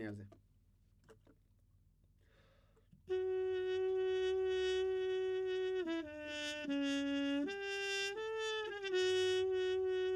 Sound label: Trumpet